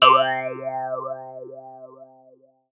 Jaw Harp

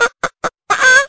Chicken Sound Effect

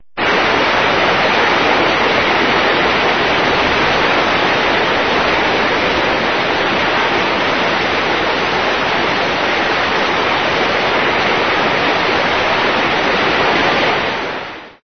Niagara Falls

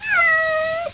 Kitten Meow

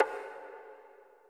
Bongo Conga Hit

